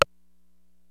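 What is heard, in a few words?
909 House; DRUMS + PERC; OTHER DRUMS; Rubber Chicken Software Sample Bank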